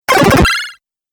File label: 8 Bit Aggresive Laser Bleep; Video Game Sound Effects